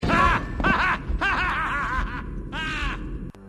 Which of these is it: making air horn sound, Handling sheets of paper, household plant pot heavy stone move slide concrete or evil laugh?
evil laugh